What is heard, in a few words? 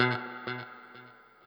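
noodle short, guitar; Quantum Leap; 90's Sample CDs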